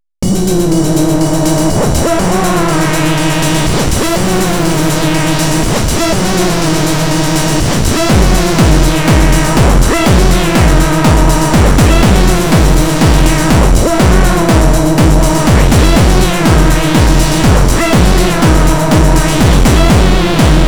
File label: pwm hoover; elektron analog rytm